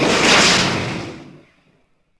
Jet Bomb Fire; Civ4 beyond sword

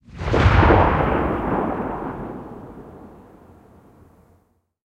cannon 37mm kwk36 tail far; guns; tanks; War Thunder

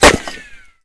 impact masonry; weapons; Modern Warfare

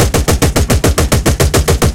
loop dshk

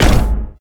jump mech

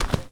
asphalt walk

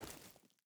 footstep burlap gravel walk; Rust

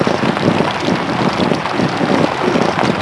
millstone; ambient map asia; World of Tanks Blitz

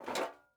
pickup metal sheet; Rust

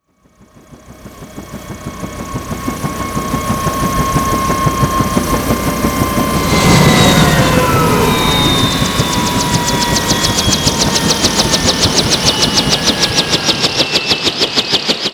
heli crash; Modern Warfare

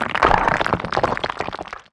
rubble; ModernWarfare

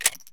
ammo unload; Escape from Tarkov; ambush

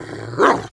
attack; Rust

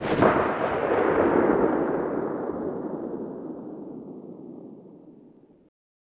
imperial sniper 1shot echo

